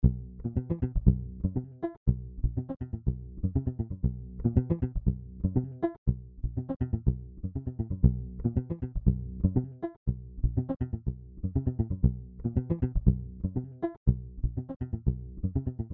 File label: Bass Blip